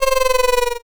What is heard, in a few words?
goat